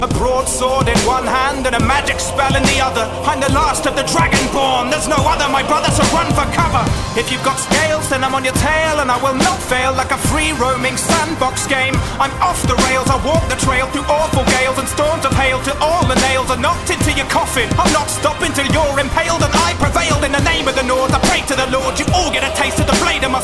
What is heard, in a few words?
epic rap; skyrim